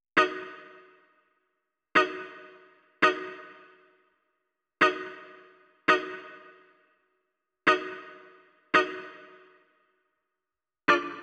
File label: Low Slung, Hip-Hop, 84